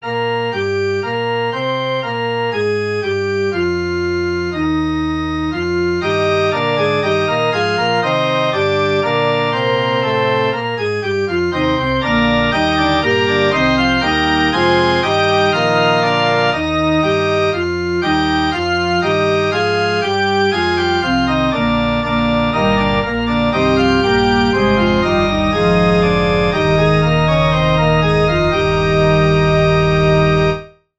forspil; o havde jeg dog

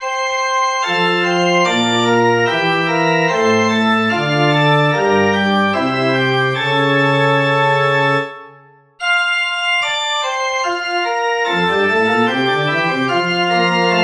forspil

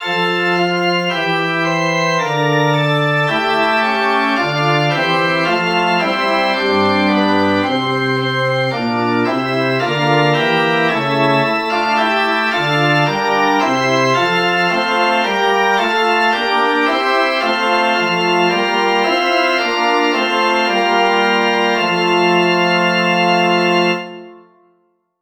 forspil

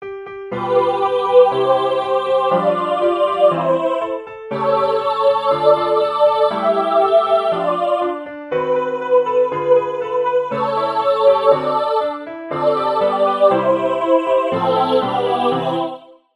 fdselsvise c dur 2. stemme

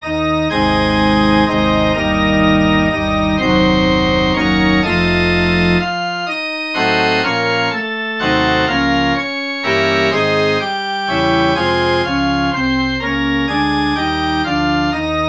forspil; nu ringer alle klokker mod sky

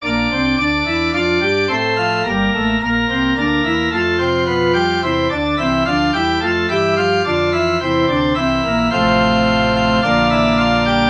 forspil; ddk 240 i blev skabt

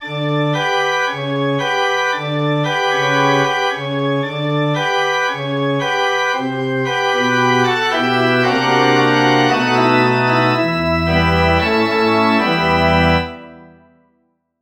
forspil

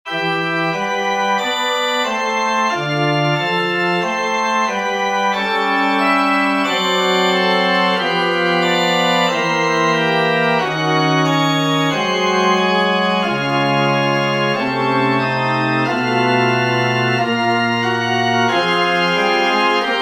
interludium

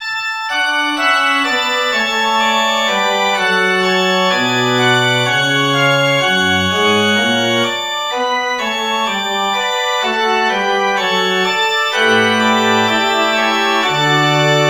forspil vi synger med maria, organ

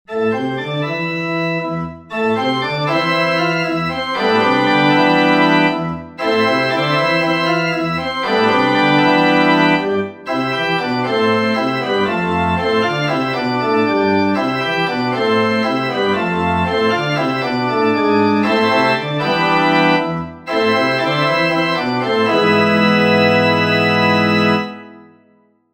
forspil

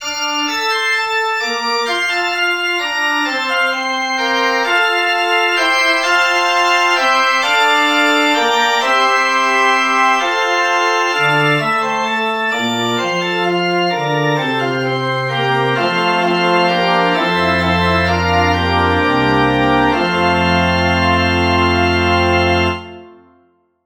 forspil

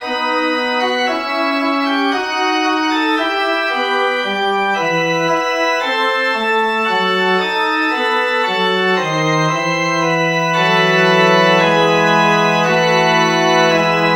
forspil

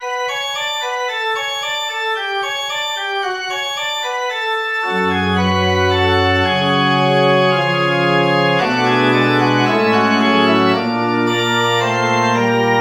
forspil

